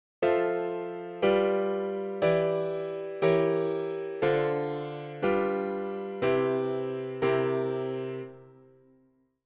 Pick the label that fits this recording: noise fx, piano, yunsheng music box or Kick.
piano